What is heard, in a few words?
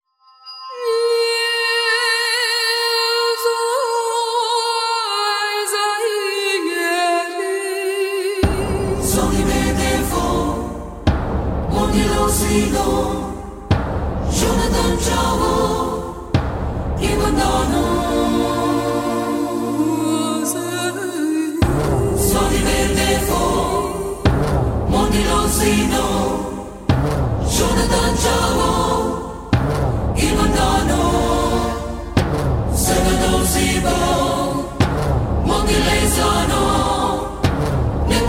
Theme Music; Thotti Jeya